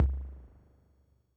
Pump up the bass

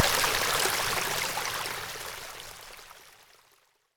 Creek, long

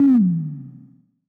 Analog synth

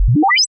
Sweep pan shorter, Synth

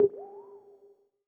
Analog synth

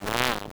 Wow, Synth

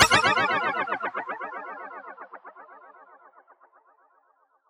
Laugh, Synth

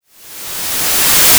Reverse noise

